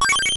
Sfx Collect; Pizza Tower early build test ost

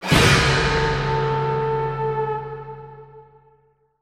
Music Stingers - Battle Stinger; conan xbox 360 gamerip 2007

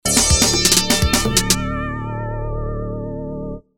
Victory; Mortal Kombat; SMD; Courtyard

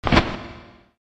Wings; mega boulder rampage gamerip

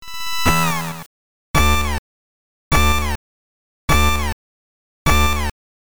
Score Results Jingle; pop'n music GB Animation Melody

